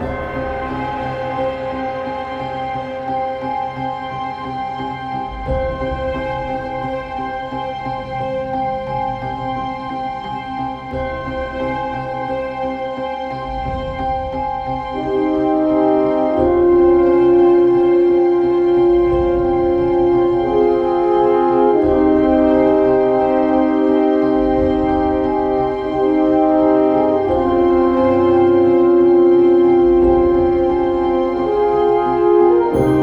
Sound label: Winter Ambient Night Loop; Fortnite